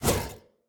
whip swing; smite; osiris

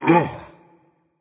ugh berserker; cuchulainn

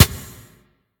basic hit; smite

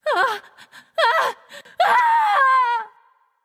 fear; smite; voicepack; nike

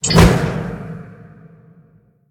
Melee Large; smite; god bakasura

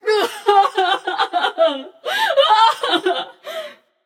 boohoo passion; chaac

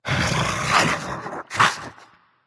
grumble berserker; cuchulainn

